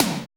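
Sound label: energy tom hi; Drums In Your FACE!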